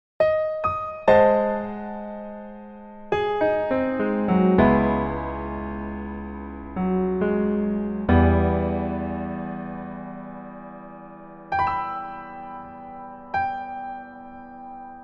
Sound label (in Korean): Piano ver, -1키